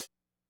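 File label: closed hi-hat, classic machines drumtraks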